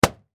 poof; blopper

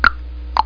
Spin S Line; SketchBook 2.1

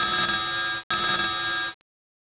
ring; DOS/V Power Report 2001 June

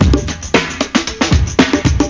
breakbeat; World of Sound; instruments; beats

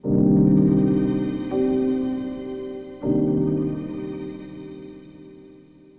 SYNTH PAD AMBNT 160 C; Ambient; Sphere

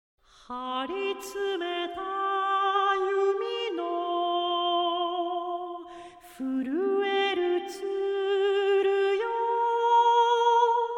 (Vocal) - Ending; La Princesa Mononoke; Princess Mononoke

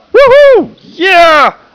winning

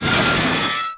Scrape, sfx; urbanchaos

